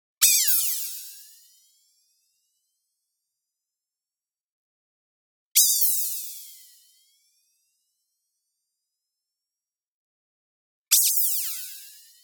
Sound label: ZAP Light Ping Modulated Up; boom library; Anime Essentials; DSGNMisc